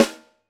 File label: Piccolo, drum